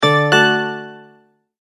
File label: multimedia tone verified